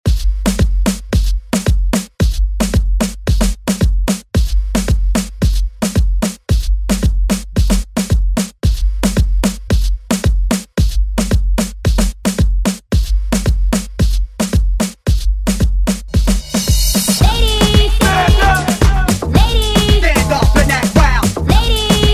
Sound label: Moombahton Hype Redrum